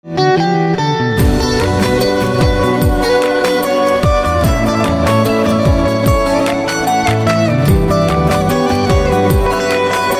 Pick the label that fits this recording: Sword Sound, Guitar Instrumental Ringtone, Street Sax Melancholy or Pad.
Guitar Instrumental Ringtone